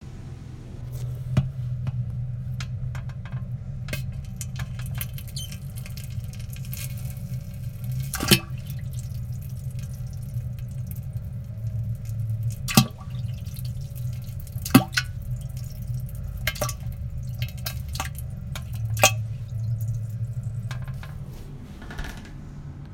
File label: bubble squeak